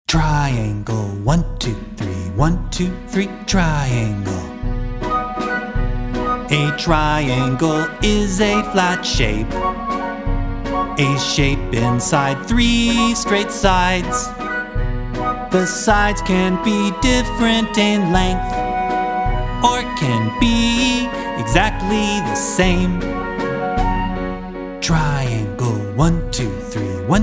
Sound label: Waltz